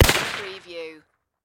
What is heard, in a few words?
Rifle M1 Carbine